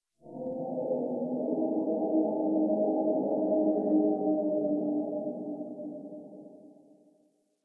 Exterior Distant Calls Scared, Ambience; Warframe; Duviri

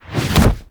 Camera Latch; Warframe; Quests; Chimera Prologue

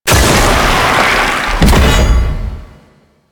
Loot Crate Medium; Warframe; Entrati

